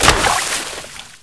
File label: water